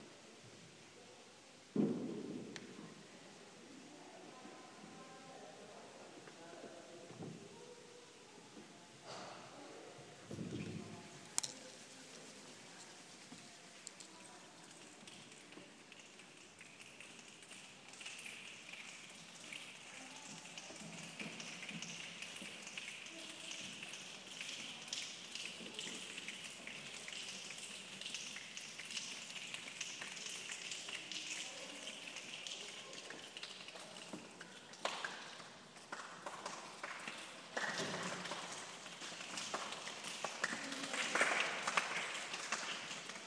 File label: creating a thunderstorm at the drumming workshop